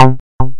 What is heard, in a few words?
bass, synths